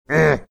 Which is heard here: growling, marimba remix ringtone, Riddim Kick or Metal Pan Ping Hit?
growling